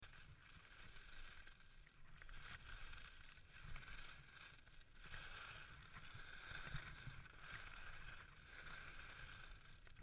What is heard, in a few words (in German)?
Skipiste